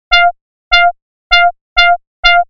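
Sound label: trumpet FM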